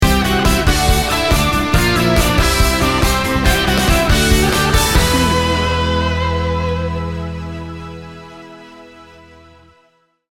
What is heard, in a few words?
showtime rock sting bumper